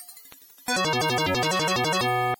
Game Over; Flicky; Genesis

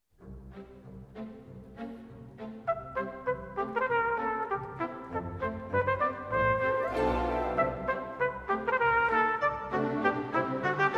Trumpet Concerto; Ren & Stimpy

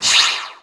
ROCK

